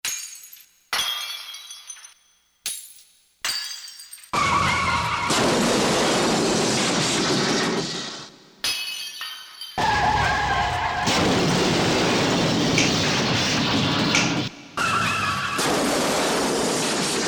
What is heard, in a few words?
Korg DSS-1 Sound Tapestry; Sound Tapestry 2